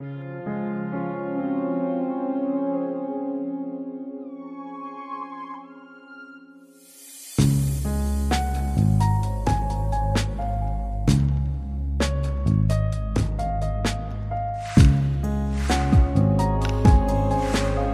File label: Berceuse in d flat major op 57 Remixed